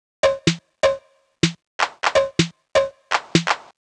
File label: drum past percussion 125, Classic Chicago House Drum Loops